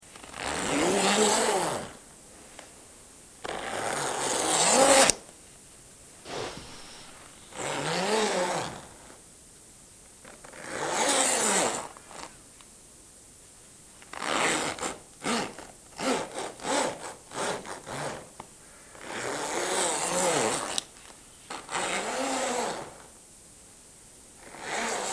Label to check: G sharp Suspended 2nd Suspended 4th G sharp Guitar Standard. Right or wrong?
Wrong